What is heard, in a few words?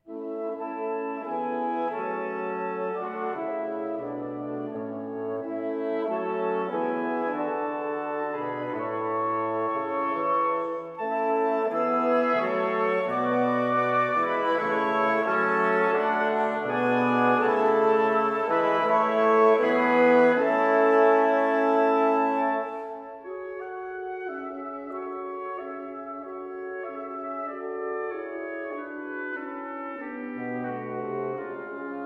Quintet for Flute Oboe Clarinet Horn and Bassoon